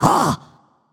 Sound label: notice; danzaburou